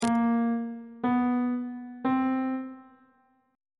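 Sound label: d double sharp diminished 5th perfect 5th augmented 5th intervals on bass clef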